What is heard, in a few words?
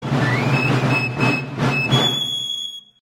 notification sms